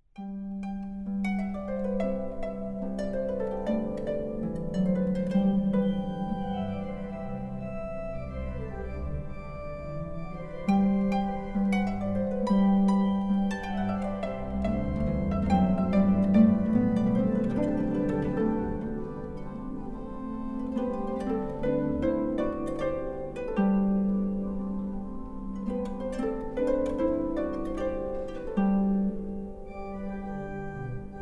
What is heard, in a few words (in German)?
Harfe Orgel